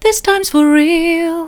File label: this times for real soft, vocal